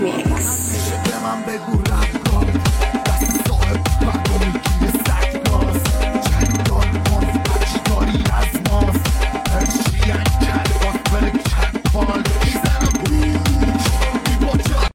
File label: bandari